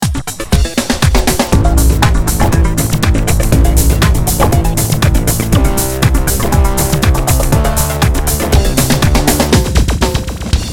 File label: ident; viva swissdownload